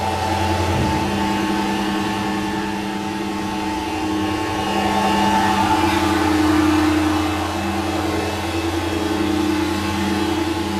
Assembly Conveyor Move Close; Boomtown; Titanfall 2

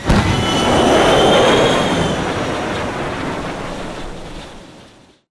crow fly away; classic mp intro; Titanfall 2

